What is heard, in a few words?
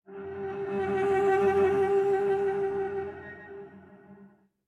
Cello Octave Flutters; SoundsCrate